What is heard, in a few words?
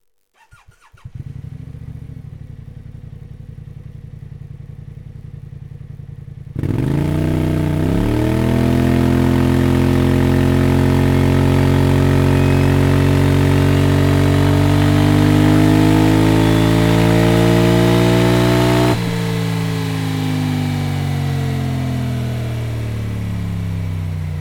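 slip on, exhaust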